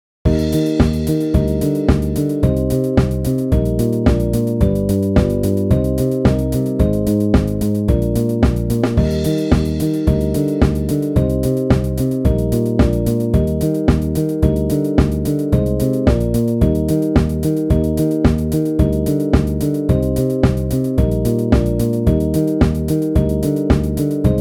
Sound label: Eb Warm-Up Slur, brass